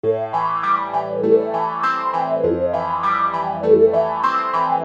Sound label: Mellow Wah, Hip-Hop; Construction Kit; Sonic Foundry (Sony Creative Software)